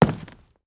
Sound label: Soft Wood Walk